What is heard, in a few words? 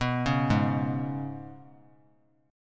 Ab Minor Double Flat 5th down; Abmbb5; arpeggiated